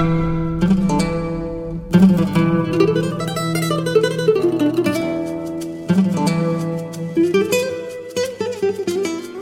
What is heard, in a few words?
guitar ringtones